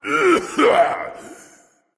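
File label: nonverbal clear throat; smite; voicepack; ullr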